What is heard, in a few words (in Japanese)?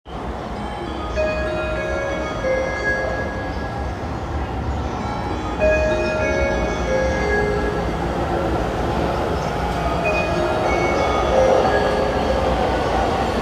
高崎線 赤羽 南 接近, train sounds